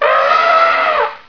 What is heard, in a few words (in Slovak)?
Slon; Revelation Sight and Sound SK